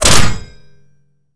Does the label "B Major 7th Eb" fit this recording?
No